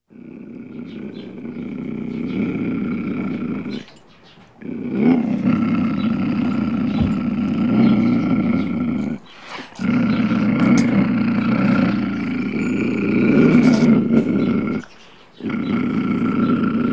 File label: Mountain Lion Snarl; Halloween sound effects; Werewolf